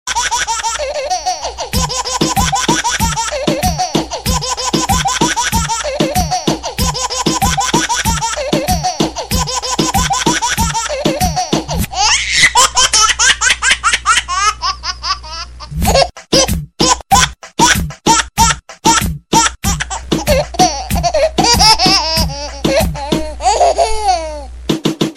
Babay Laughing Remix

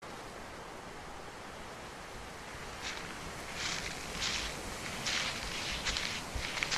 Footsteps; Slender

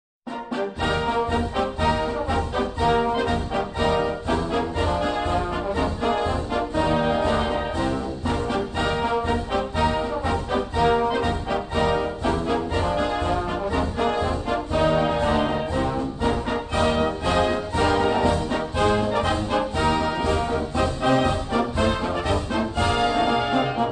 Military Army

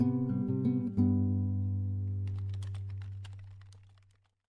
Guitar

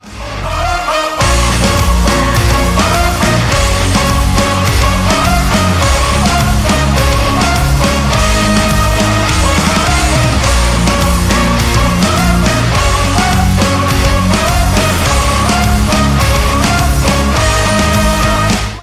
Norwegian Reggaeton

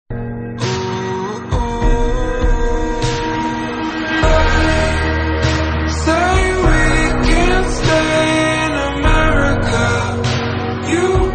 Xylo America

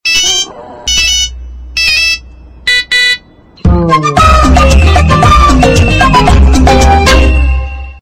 Drum; Idea Cellular

